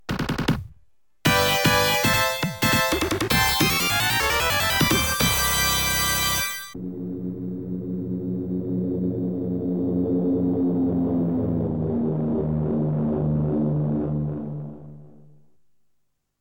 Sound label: Stage Clear; Capcom Game Music Vol.2